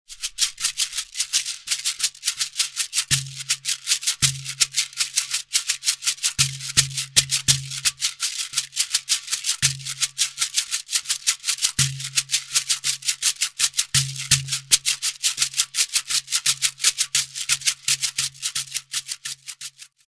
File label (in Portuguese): xequere